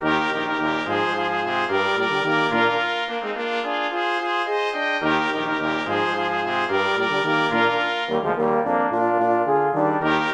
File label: Fanfare For Christmas